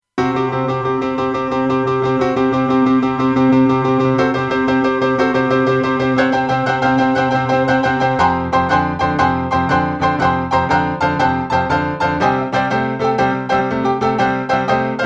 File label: Piano Solid State; Universal Audio 710 Twin Finity